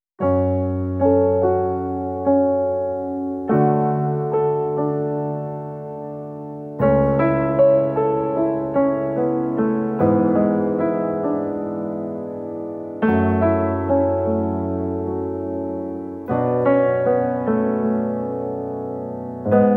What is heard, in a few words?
Piano Sad